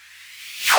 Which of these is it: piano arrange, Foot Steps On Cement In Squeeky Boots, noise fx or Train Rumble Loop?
noise fx